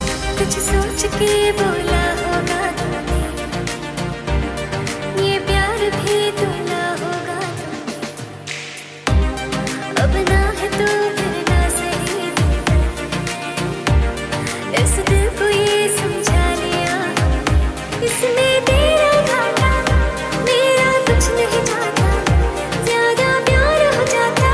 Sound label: Female